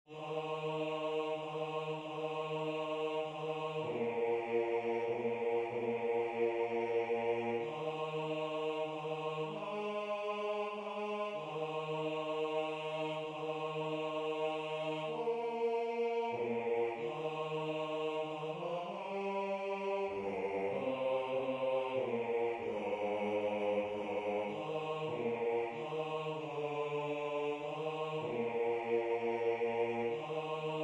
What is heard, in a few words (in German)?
Sah ein Knab ein Röslein stehn Basse